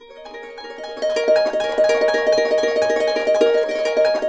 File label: BB, Harps; Complete Harps; USB Soundscan vol.62; AKAI